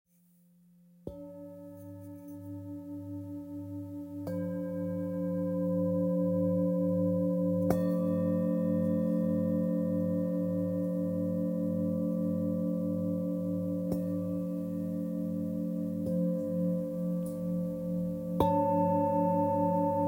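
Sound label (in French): Set merveille, bol chantant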